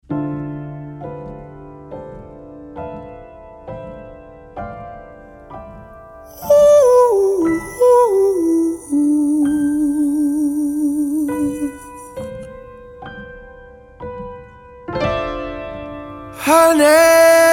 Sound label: Honey Piano Version